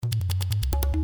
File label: Tabla Random Indian Classical Beats